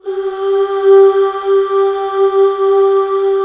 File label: UUHH G4; Best Service Gigapack 1